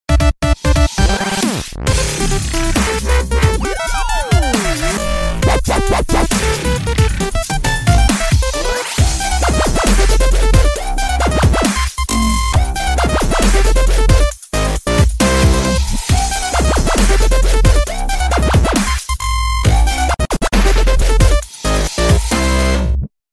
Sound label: dubstep; Super Mario